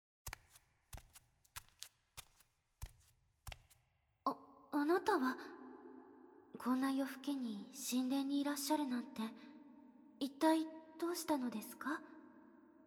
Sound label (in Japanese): 耳舐め; 女神官マリーダ; 超ウィスパーオホ声; KU100